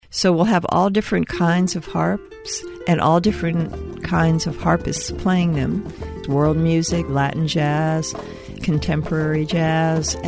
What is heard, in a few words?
Jazz Harp